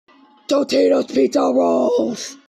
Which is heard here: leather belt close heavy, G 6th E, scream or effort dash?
scream